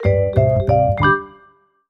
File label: Jingle Pocket In; Clubhouse Games: 51 Worldwide Classics